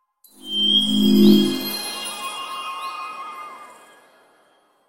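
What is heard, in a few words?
spring SMS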